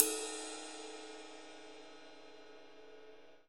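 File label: ride cymbal; E-MU Producer Series Vol. 7 – Old World Instruments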